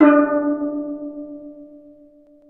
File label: Steel Drums D3; NorthStar - Global Instruments VOL-1